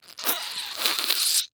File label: Sponge Squish Short, Household